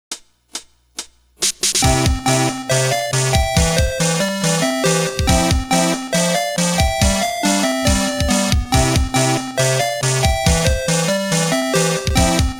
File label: Simple Folk; Gyakuten Saiban; Gyakuten Saiban 2 Original Soundtrack